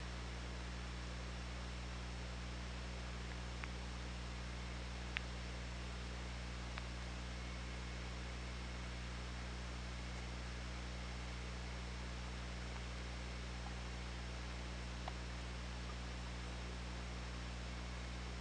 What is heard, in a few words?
OrcaLab